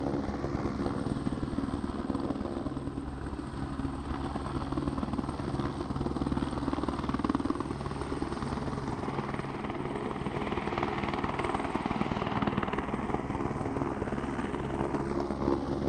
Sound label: military heli loop